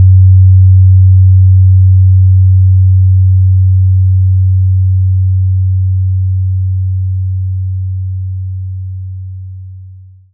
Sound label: Sine 93 75Hz; knights try